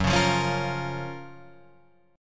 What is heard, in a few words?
F sharp Major 7th, Guitar, Drop D